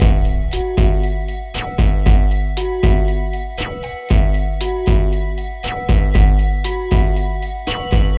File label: Machine, Drums Beats